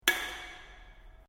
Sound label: Can Aluminium Inside Drop; various metal cans; Drops; Objects